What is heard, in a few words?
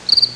Single cricket chirp in the night